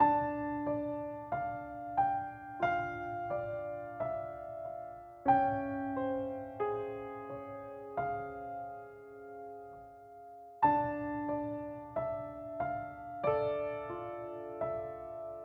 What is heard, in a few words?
Piano Dramatic